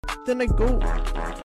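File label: funny fart noise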